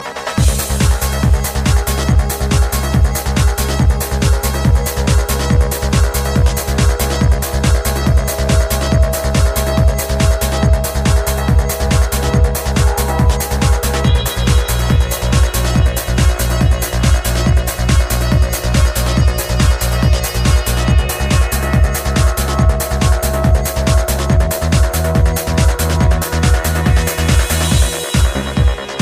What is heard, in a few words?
Tecktonik